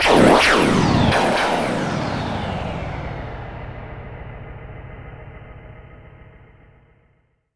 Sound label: Bursting Electric Whoosh